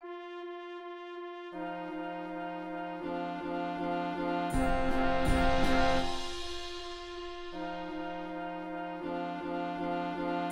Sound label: beginning band version